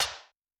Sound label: An Air Rim, Yamaha DTXpress-II snare